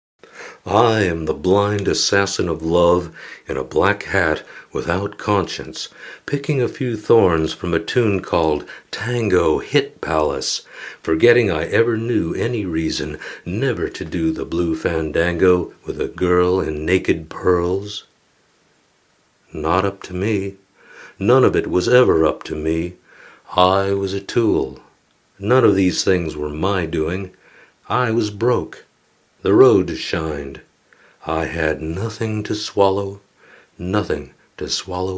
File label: Tango